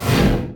preattack; dota; skywrath

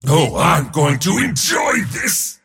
cast pain; dota; mars

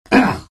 pain, vo; arc warden

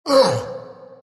arc pain; Dota 2; Zuus

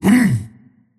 pain; dota; tiny

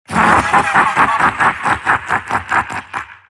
silt laugh; dota; siltbreaker

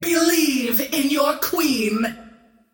scream great; queenofpain

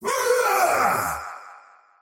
attack big; dota; faceless void

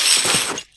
chains, movement; lifestealer

